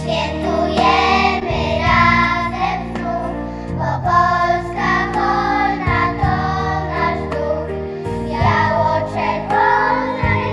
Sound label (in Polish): dzieci spiew; festyn z okazji dnia flagi rzeczpospolitej